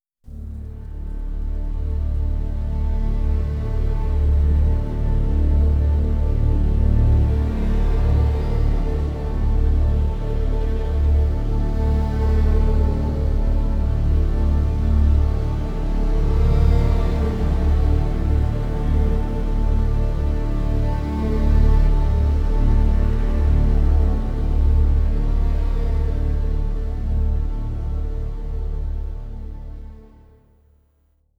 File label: drone